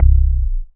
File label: SNGL.BASS, Jungle Rave